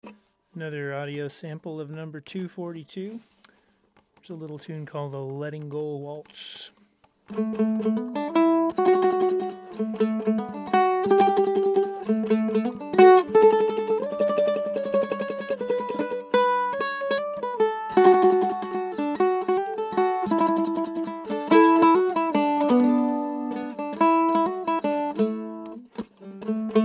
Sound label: Waltz; v-style oval